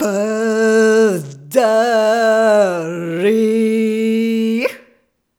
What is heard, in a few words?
Rhythmic A